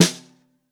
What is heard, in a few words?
SN2 Bottom, Snare; Ableton Foundation FreePacks; Snare Designer